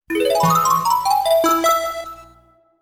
multyashnij rington na sms

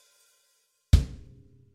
odin udar po bochke

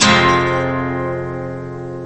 gitara akkord fa minor fm voshodyashij

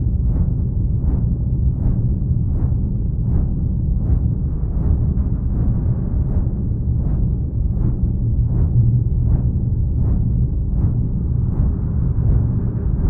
sloistij zvukovoj dizajn bolshogo zhutkogo ventilyatora blizkaya poziciya

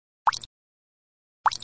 zvonkaya kapelka

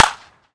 bistrij korotkij uverennij udar bitoj po myachu

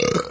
otrizhka

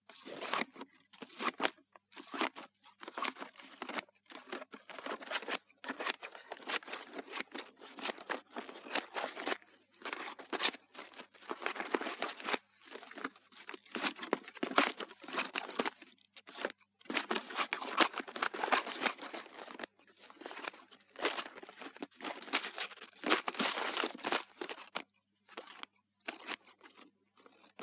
nozhnici rezhushie bumagu

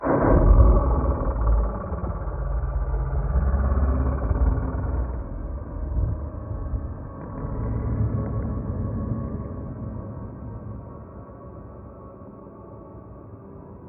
zvuk uzhasov na hellouin zataivshijsya monstr

